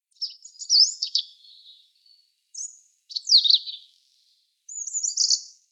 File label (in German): Braunschnaepper Muscicapa dauurica; Vogelstimmen